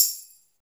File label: PERC, 160 BPM, Drum & Bass; Ueberschall; Drum & Bass Flash Trackz